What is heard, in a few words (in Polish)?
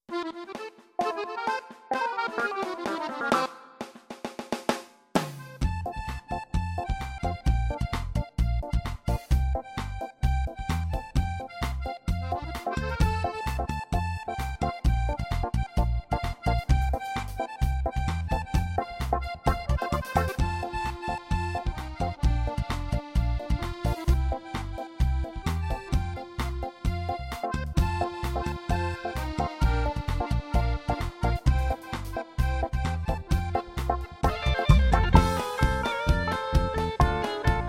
wersja instrumentalna